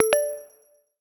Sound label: Copper, notifications; Honor Pad X7